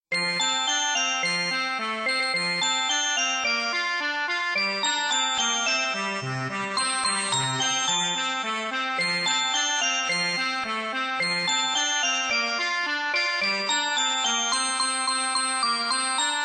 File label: X'mas song; ringtones